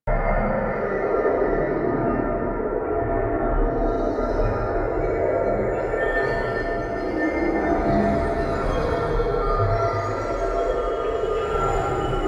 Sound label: Time Stop Loop; Warframe; Quests; The New War